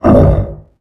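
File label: biomech air voice punch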